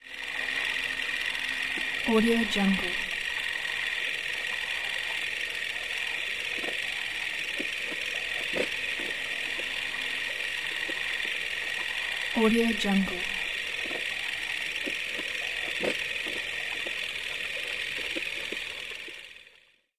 old movie projector

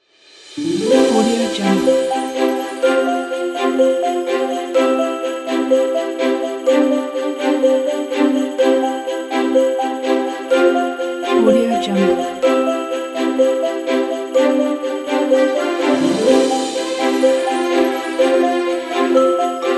happy christmas

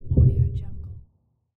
underwater light metallic impact

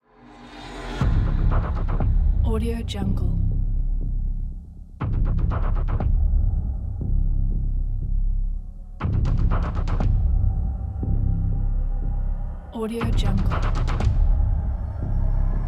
hybrid trailer

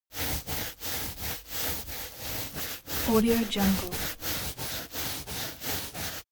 object balloon scrub hair

